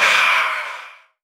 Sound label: "ah" exhale